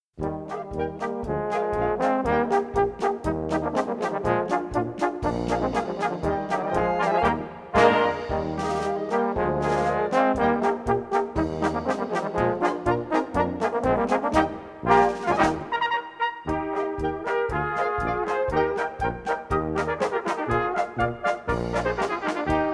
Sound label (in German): Swing Polka